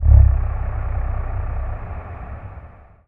Deep Breath

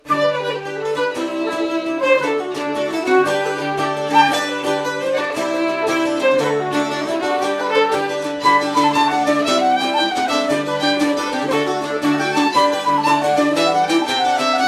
Orchestra Ambience; Assassin's Creed III

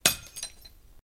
brake sign cover

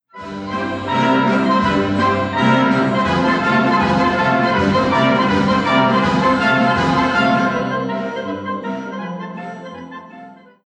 Rhapsody; Jim is Moving Out; Hungarian

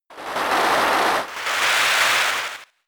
Muddy Water; attack moves; Pokemon; Gen 4; DPPL HG SS

